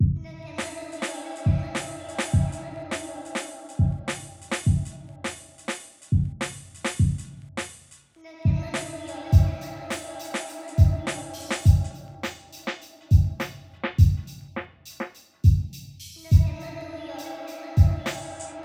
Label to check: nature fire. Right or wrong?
Wrong